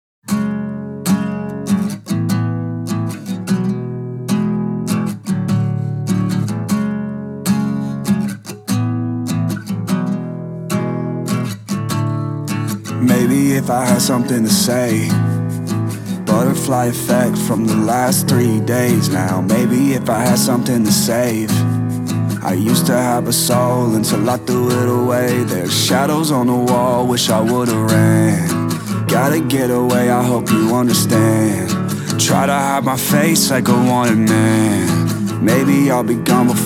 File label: (acoustic version)